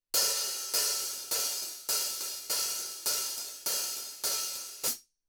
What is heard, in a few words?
14 HH OPEN, cymbals